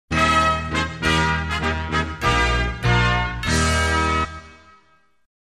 Joy To The World, Brass Band and Small Group

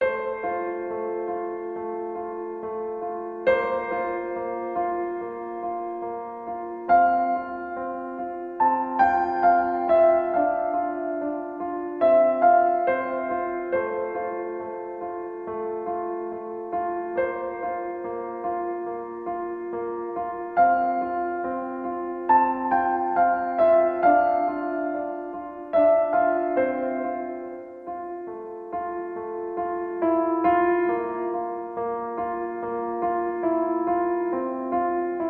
Piano Solo

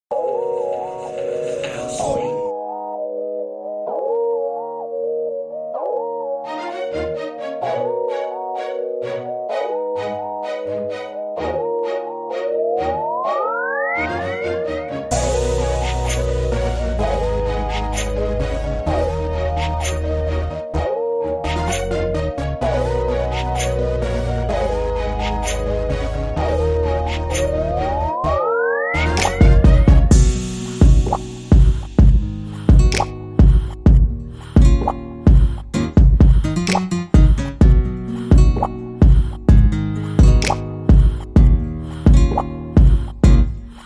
Acoustic Rain

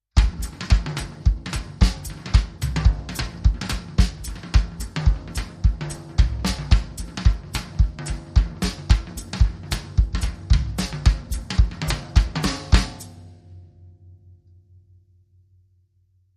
Jive Bass Go Round

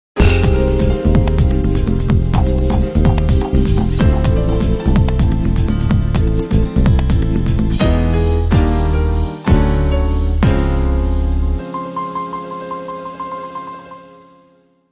Future Bossa

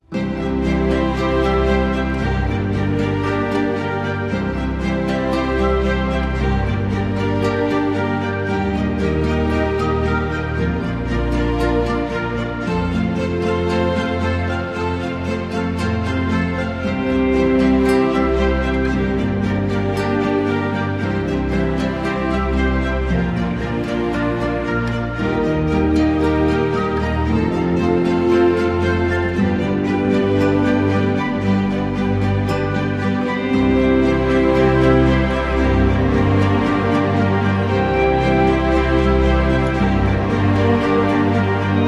Less Woodwinds Glockenspiel

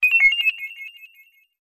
Beeper Trail

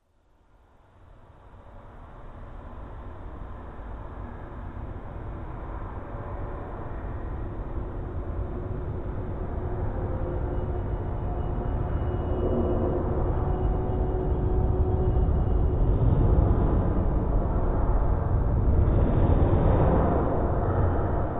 No Rest For The Wicked Ambience

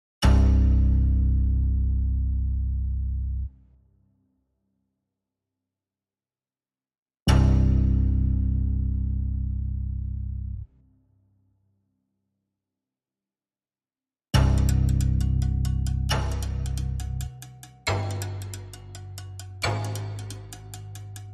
Drums and Bass

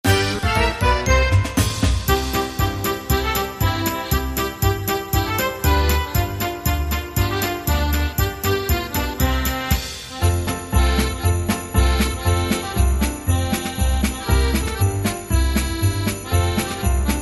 Christmas Polka

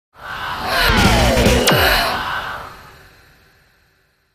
Lazer Girl

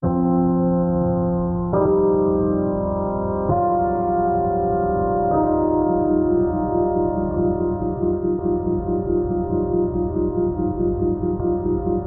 Pianoscape

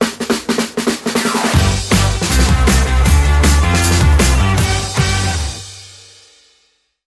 Sting

